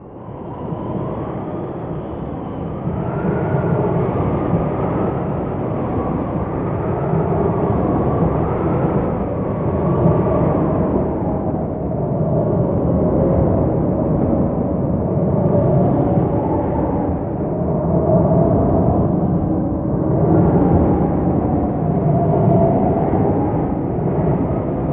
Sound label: strong wind; ambience stereo